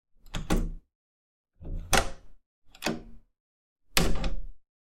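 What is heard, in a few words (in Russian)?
zapis zvuka izdavaemogo oknom, recording of a window sound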